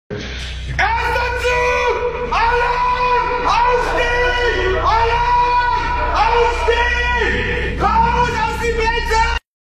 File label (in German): zug alarm